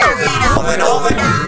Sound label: vox FX; Big Beat Samples & More